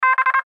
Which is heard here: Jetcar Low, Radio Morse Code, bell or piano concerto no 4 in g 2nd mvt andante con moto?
Radio Morse Code